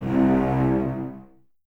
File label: Cellos Detache, String Ensembles; Miroslav Vitous